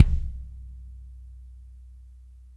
KIK2B R; Spectrasonics; Burning Grooves CD2; KLANKY KIT

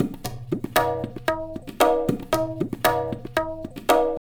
tabla, 115 bpm; Zero-G; Pure Tabla

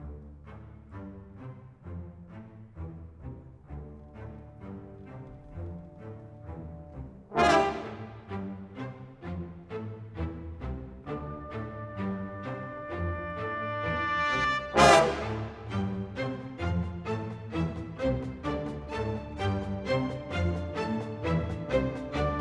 Rock Pop, Orchestral; Sonic Foundry (Sony Creative Software); Orchestral Series VOL-4 - Rock and Pop

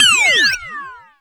BEEP; Best Service; Hyper Dance; CONSTRUCT

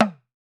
TAM TAM, African Drums

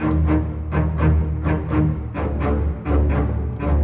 Bass Cello; Sonic Foundry (Sony Creative Software); Orchestral Series VOL-4; Rock and Pop; Rock-Pop 18

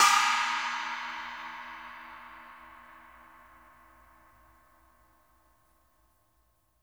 PANG, cymbals; Sampleheads; New York City Drumworks VOL-1

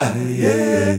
Male Voice, African Voices; Quazibeat; African Secret; Male Vocal

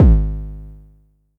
Low Kicks G#1; LIMAT Div Dept; Drums

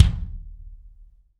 BD AMBIENT, Drums; Real Mega Drums

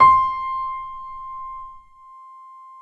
PNO MF; Synclavier Sampler Library

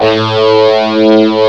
guitar A2; AKAI S-Series CD-ROM Sound Library VOL-8; SET#1 GUITAR

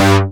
SEQUENCER, synths & keys; Techno Trance

